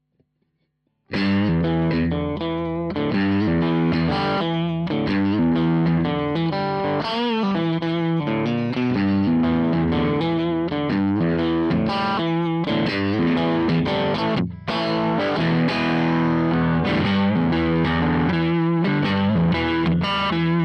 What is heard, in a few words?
70s Bassman Strat